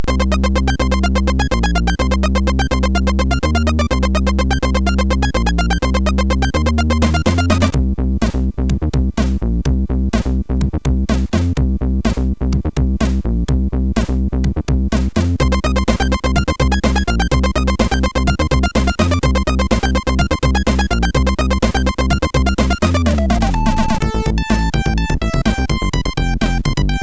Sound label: Oldies Remix, MOS 6581